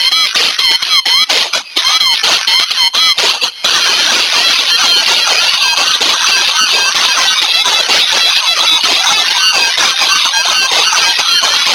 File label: techno